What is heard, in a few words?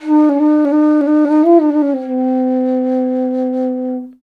INDIA BASS, IND BASS